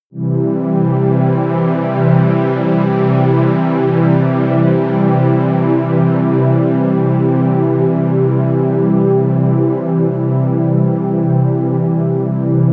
sweep air, music elements

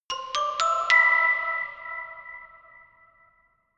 notification glockenspiel creepy sequence ascend

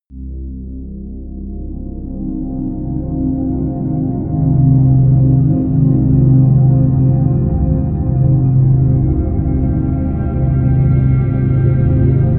drone punjabi

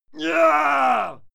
male fight scream pain; soundpool

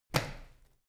medicine ball catch, fitnessstudio

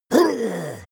leprechaun gasp hit; koerpergeraeusche; comedy-cartoon; soundpool

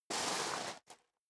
step leather shoes gravel medium scuff; schritte

